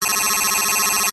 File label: science fiction data received tone; zapsplat